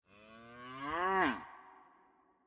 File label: cow moo